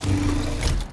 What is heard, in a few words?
Monster; Zenless Zone Zero